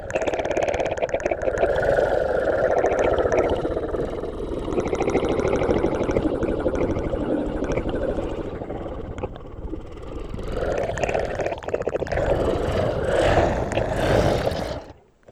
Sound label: Beast Clicky Mouth